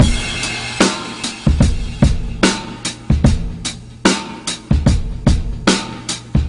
Boombap drum loop

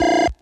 blerp, Zaps and Beeps

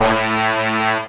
Horns

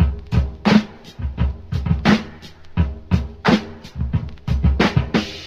clap on, funky drum loops

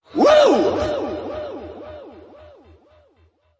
"woo"; Voice; ooh ahh

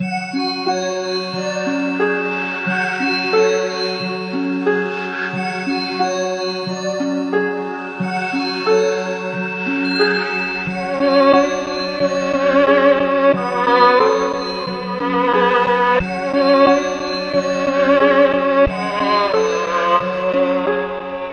strings and keys, Sad; loops

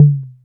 oapsalong, Toms Electro

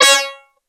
twangy trumpet; Notes

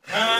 "huh"

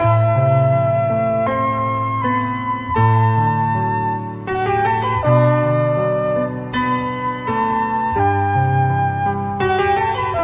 piano, Loops Sad

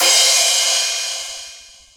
Cymbals

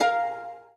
harp; String

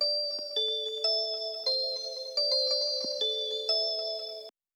melody

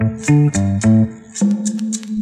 bass; Phonky

